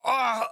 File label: AHurt